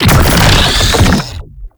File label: Slinky Dead Zone Charge; Warframe; ManInTheWall